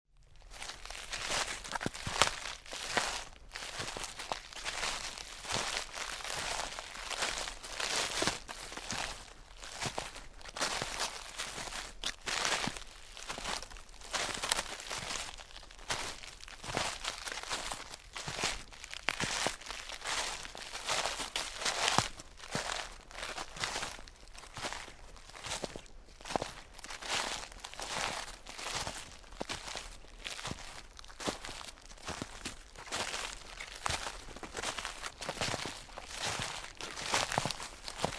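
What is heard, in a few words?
footsteps in the forest on branches and moss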